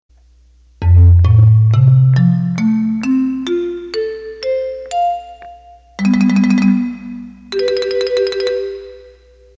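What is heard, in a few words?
Marimba, Roland accordion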